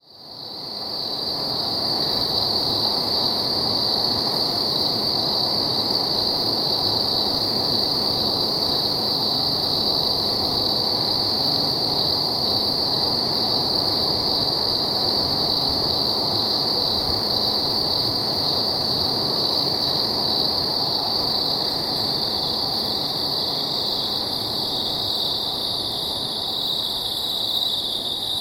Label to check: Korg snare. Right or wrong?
Wrong